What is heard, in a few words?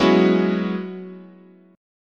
F sharp 7th Suspended 2nd Suspended 4th, chord